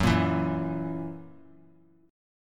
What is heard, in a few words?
F sharp 7th, down; strummed; 3C,3F,3A,3D